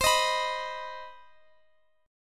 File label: C Minor Major 7th, chord; strummed; CmM7; x,x,x,17,16,19